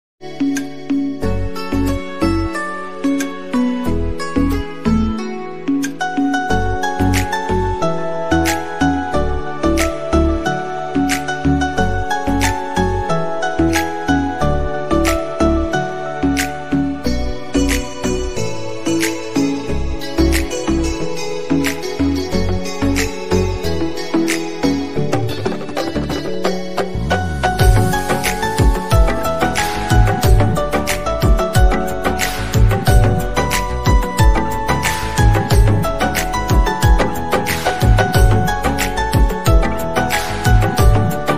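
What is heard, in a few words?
hindi instrumental ringtone love ringtone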